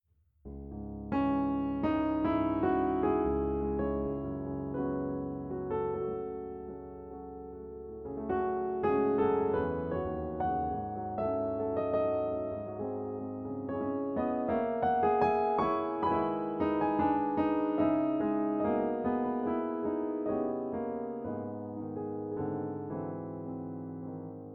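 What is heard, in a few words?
C3 vs K1, Pianoteq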